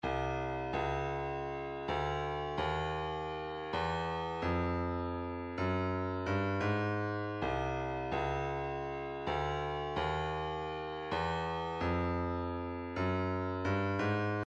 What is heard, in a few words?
130 kick, midi